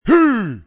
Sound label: leap attack; d2 barb